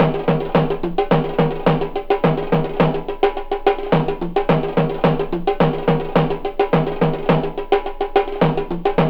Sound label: CEYLON DRUM, EASTERN DRUMS; Zero G - Ethnic